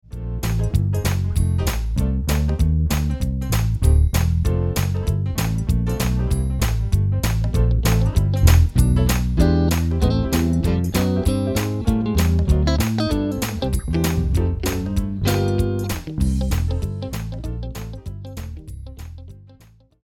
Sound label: Backing Track